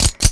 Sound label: mk23 slide